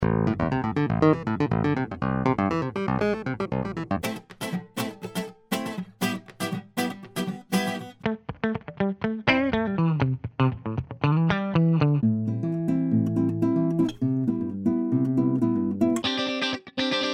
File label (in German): Klangbeispiel VCP120